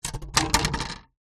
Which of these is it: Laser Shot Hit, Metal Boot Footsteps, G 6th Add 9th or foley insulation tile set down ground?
foley insulation tile set down ground